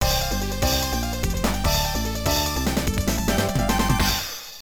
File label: Match Start; power spikes ii arcade; World Women League